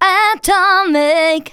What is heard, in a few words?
VOCALS SUNG; Techno Trance Essentials